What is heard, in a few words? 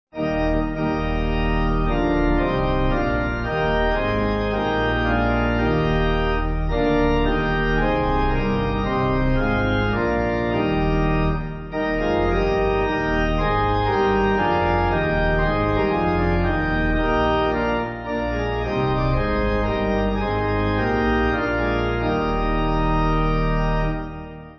Pipe